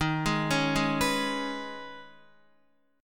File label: D sharp 7th Suspended 4th Sharp 5th D sharp, Guitar; arpeggiated; 4F,4A,5C,5G,5C,6E; D#7sus4#5; x,6,8,6,8,7; JGuitar